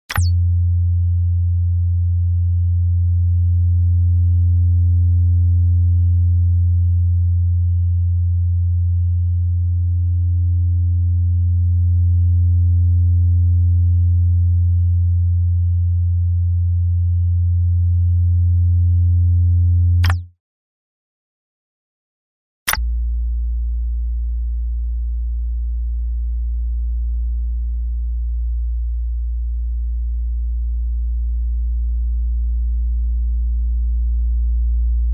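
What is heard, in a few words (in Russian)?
включение выключение электроприбора низкочастотный гул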